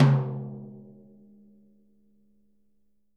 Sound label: Living Drums; Sampleheads; Peter Erskine